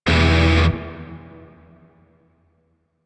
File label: Shawzin Pent Maj Chord C; Music; Lotus Shawzin; DE; Warframe